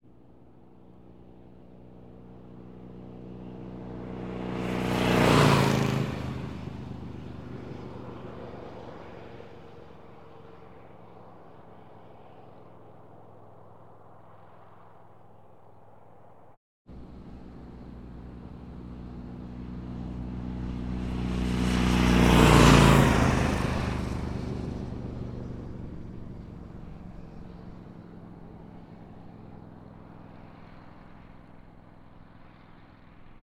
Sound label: Harley Davidson Sportster Iron 883, Passby 100kmph, Asphalt surface; 2 variations; Borg Sound